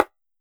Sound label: normal slider tick; argon